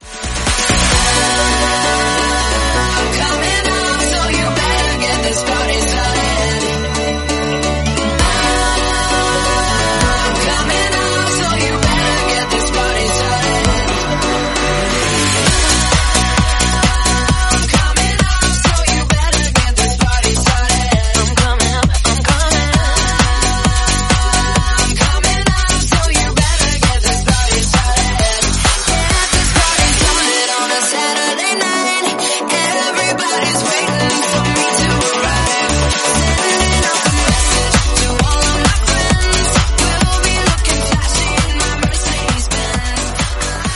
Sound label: 132bpm Bm